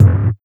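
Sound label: Darkness Kick, drum kit; Sonic Foundry (Sony Creative Software); Esoterik Beatz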